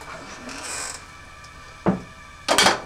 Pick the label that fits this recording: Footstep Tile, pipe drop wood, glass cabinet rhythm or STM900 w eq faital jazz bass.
glass cabinet rhythm